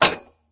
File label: axe pole ground; smite; chaac